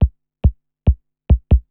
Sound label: KICK TECHNO 140, Techno Drum; Techno2